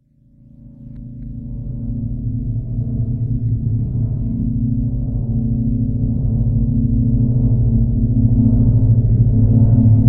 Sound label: generator